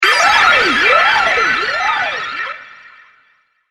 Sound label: Starfleet Computer Goes Haywire, robot sound effect; Robot Sound Effects; science fiction sound effect